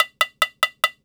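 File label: High Bongo Rim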